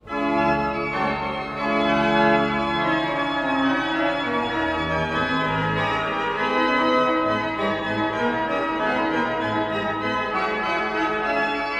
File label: tutti